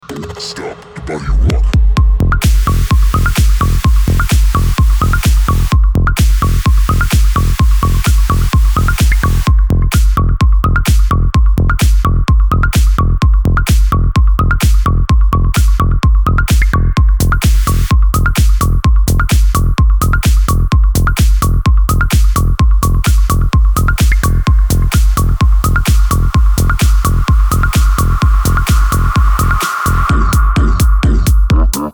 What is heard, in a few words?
minimal